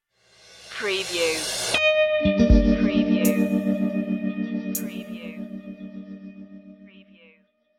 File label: spooky guitar pick stinger